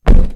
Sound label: spring, flight; lmctf